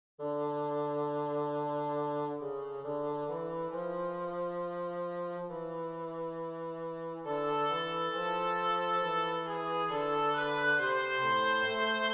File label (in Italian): Fuga in Re minore